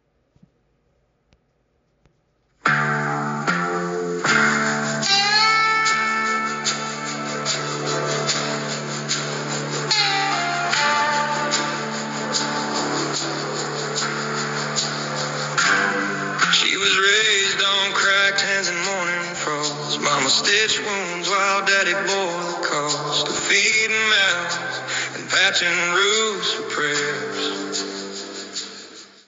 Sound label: country girl strong ringtone